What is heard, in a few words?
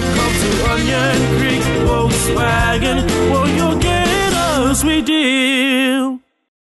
JINGLE ONLY